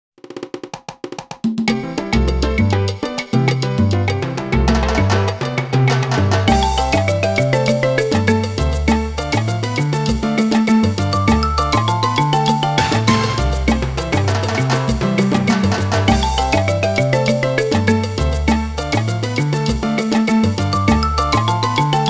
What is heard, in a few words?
Salsa; PopoloCrois II; Monkey Salsa